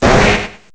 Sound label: slowpoke galarian, cries; pokeemerald